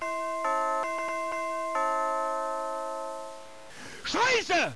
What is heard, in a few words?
doorbell